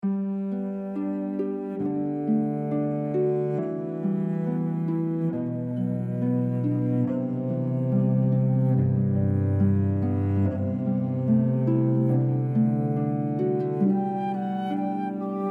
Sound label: Canon, Pachelbel, harp flute cello